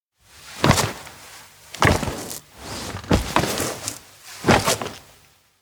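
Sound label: electronic kick wall slow; anemoi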